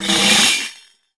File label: GOAT, GOATSKN TAMB; Voice Crystal - Steve Reid Definitive Percussion